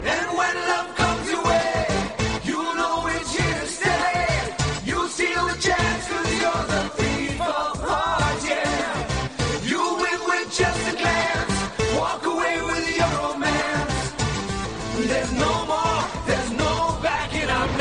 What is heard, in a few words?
english beat ringtone; doom 2; bollywood ringtones